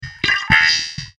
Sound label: Robotic Jump, science fiction sound effect; star wars / trek style games